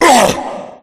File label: tank pain; csgo; zr